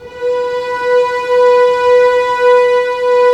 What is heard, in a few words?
STR SEC, Roland LA Composer, Sec Mute St